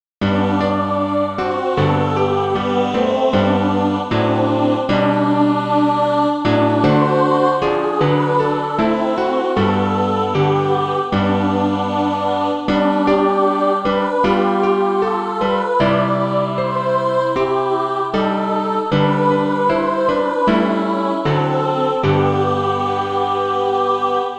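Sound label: mixed, Practise Music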